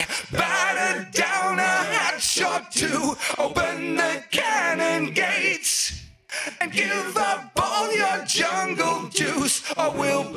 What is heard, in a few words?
Acapella